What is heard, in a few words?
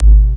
breath up